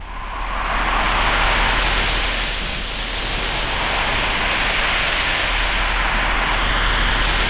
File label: STORMY SEA, VOCAL WIND; ILIO; Vocal Planet; Jazz & FX